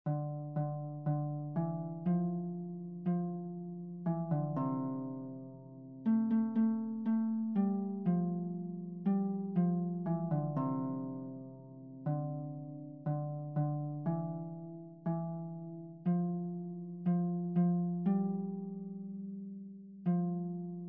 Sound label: Score Melody